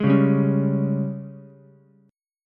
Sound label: Ab Major 7th Suspended 4th Ab down Ukulele; AbM7sus4; strummed